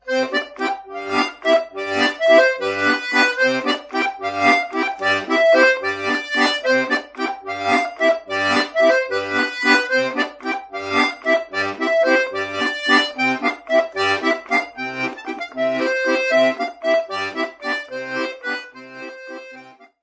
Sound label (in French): Bourree - France